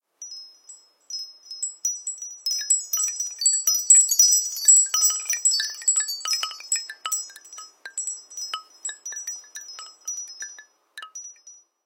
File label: Breeze, phonetones Nokia; 6700 slide